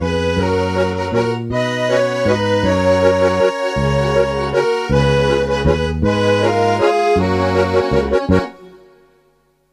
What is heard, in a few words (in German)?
treckfiedel